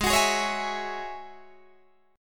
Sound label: Ab 7th Suspended 4th Sharp 5th Ab down Guitar Open D